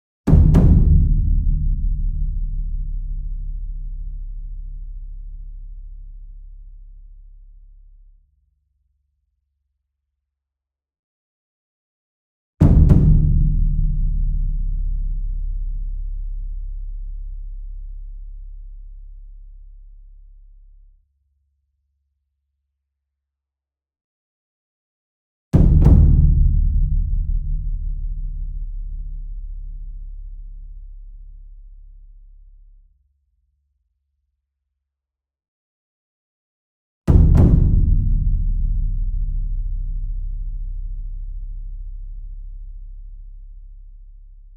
ENSEMBLE DRUM Bass Drum Concert And Taiko Double Near; boom library; Cinematic Strikes Construction Kit